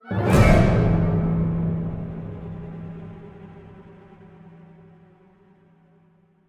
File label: Stinger; the walking dead survival instinct gamerip